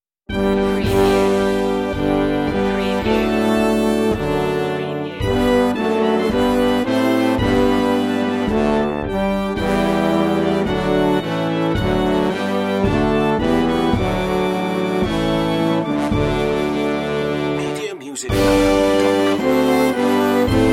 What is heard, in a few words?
o come all ye faithful brass